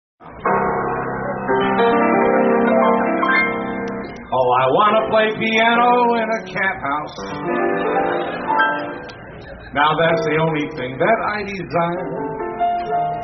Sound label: Jazz Band